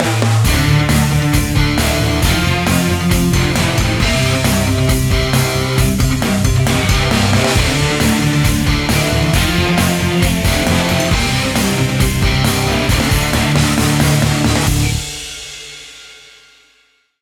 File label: Game Completed; Garfield dmx minigame3